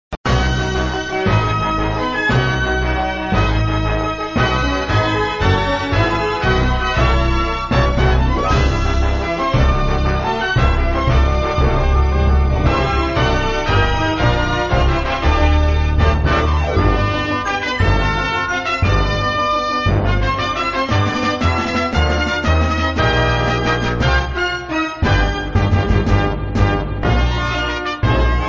Music, flipper; sttng

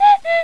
Gameover

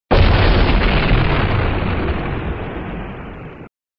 Sound SFX, flipper

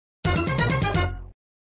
Sound Outlane drain

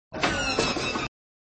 Sound Ramp Not lit (plane not starting), flipper; Ramp Not lit (plane not starting) v1

